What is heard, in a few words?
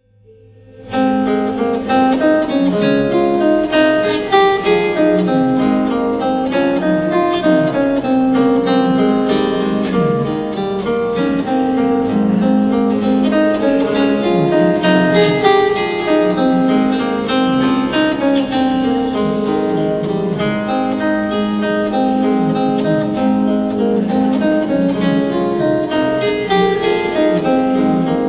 gitar